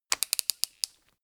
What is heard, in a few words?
creak chuck